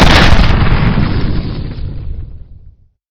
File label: macro hit ground